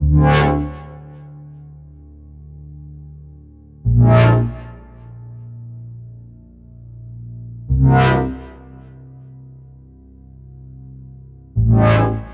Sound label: Revenge Bass 125bpm